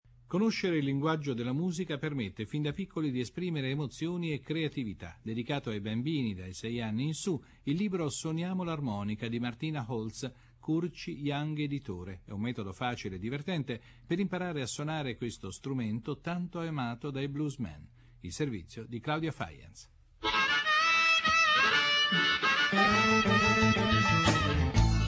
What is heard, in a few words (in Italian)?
Armonica